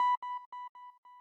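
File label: square lead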